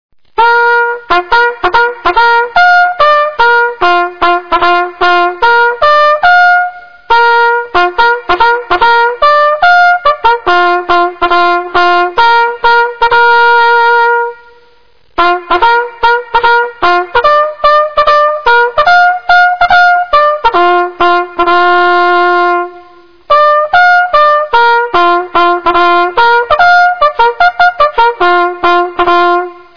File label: tattoo, Bugle calls; American Civil War